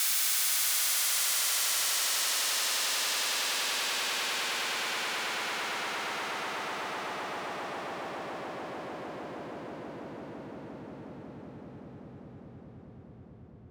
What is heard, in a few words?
White Noise Down; Cymatics; EDM Starter Pack